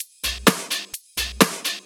Electro Loop 128 BPM; Vengeance Samples

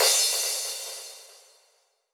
Cymbals crash; Vengeance Samples

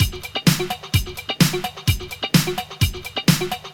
House Beat, 128 BPM

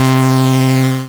Synths C; Vengeance Samples

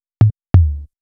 Toms, 128 BPM; Vengeance Samples; Vocal-Hook Kits; Song Kit

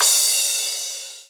Crash, Cymbals; Vengeance Samples; VEH3 Cymbals

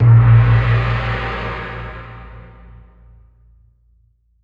Special Hihats; Vengeance Samples; VEC1 Cymbals

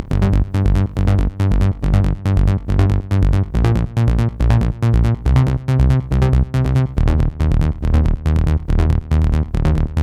Bassline WET 140BPM, G minor; Vengeance Samples; VTS1 Devastating Truth Kit

